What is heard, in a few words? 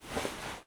cloth, weapons; garrysmod